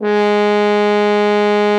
bucket G#3; Xsample VOL-3

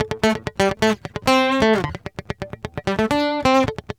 GUITAR SALSA

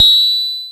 BOSS PERC SNTH, AX-60; Rubber Chicken Software Sample Bank

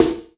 SD, Yamaha RY-10, Drums

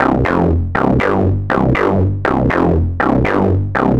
Rock Mount Bass, Bass Foundations; Sonic Foundry (Sony Creative Software); The Electronic Music Manuscript Collection

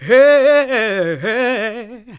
GOSP. Singin

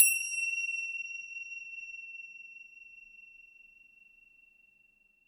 Finger Cymbal Long Hit; Sonic Foundry (Sony Creative Software); Joe Vitale Latin Percussion

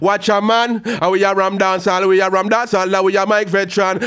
G-PHRASE, RAGGA IN G

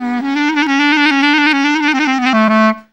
ORIENT CLARI; Best Service; World Colours

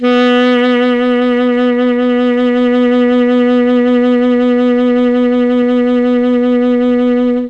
B1 ALTO SAX, Mellotron; Mike Pinder - Mellotron Archive CD; CHMB ALTOSAX

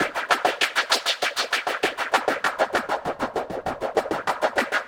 Echo Chuff Splat, Synthetic Pulses

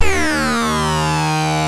RUMBLE REZ D1; E-MU Formula 4000 Series Vol. 5; Protozoa; Vintage Presets

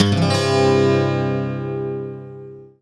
STL STRNG CRD; Best Service; World Colours

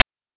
PITCHED NOISE; NES DPCM; MIDINES

